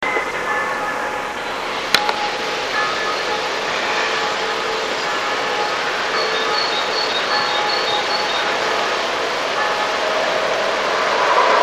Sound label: bells